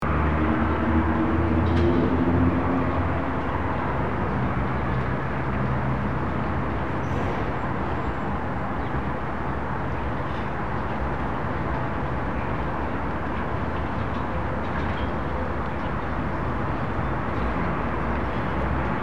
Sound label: Rooftop ambience city distant traffic and wind loop